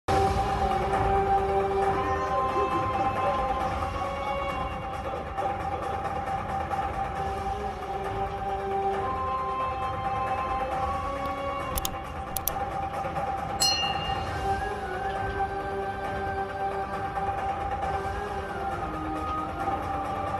movie sad bgm ringtone bgm; ambajipeta marriage band

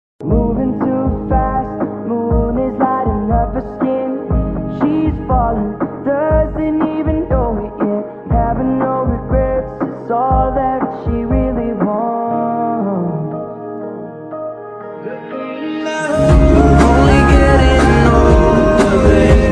slowed reverb ringtone, song ringtone

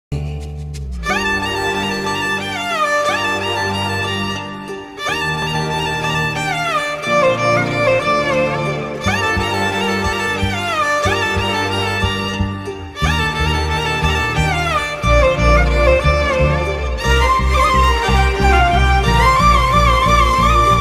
music ringtone; policeodu

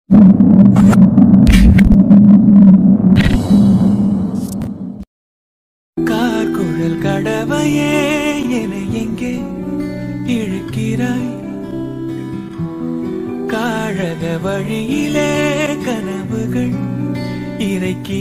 song movie bgm ringtones classic bgm; vadachennai

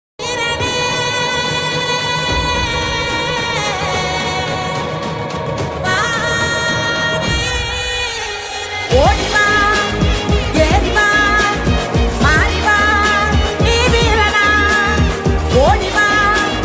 trending bgm; veeran movie

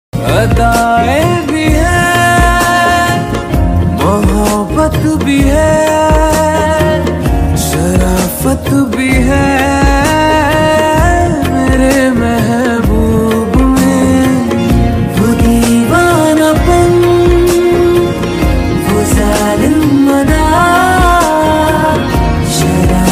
trending love beat ringtone telugu bgm ringtone

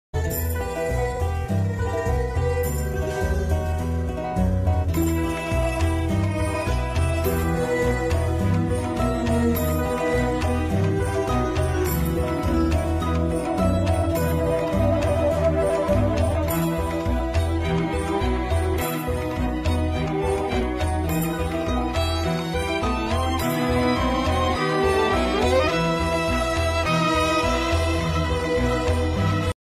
movie ringtone telugu bgm background music

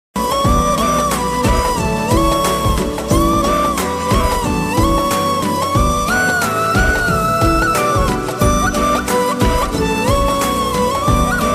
telugu movie theme music; savitri; nara rohit; nanditha; pavan sadineni

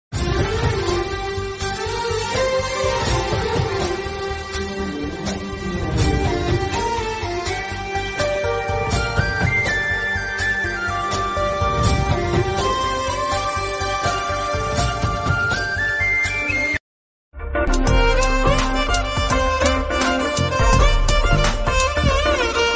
alludu adhurs movie bgm ringtone

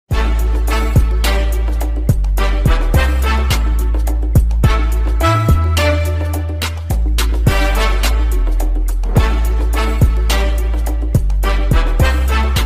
anime ringtone; one piece; monkey d luffy

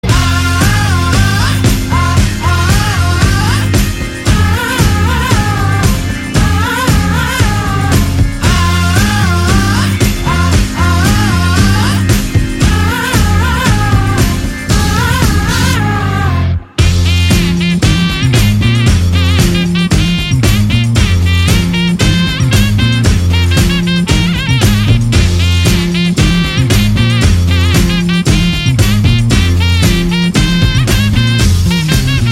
Bgm; vikram; Wasted

